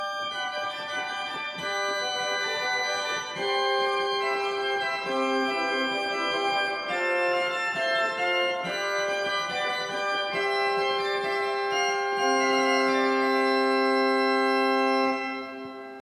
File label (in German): mixtur, orgel